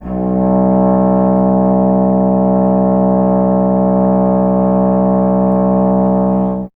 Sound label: tuba; Miroslav Vitous - Solo Instruments VOL-2